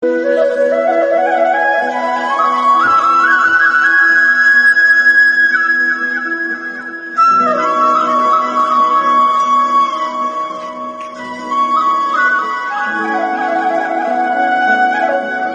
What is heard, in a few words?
Pahari Bansuri Ringtone; himachali